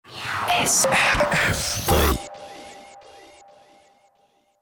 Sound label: STINGER Balloon; SRF 3